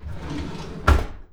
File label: DRAWER, household; AKAI Anime Sound FX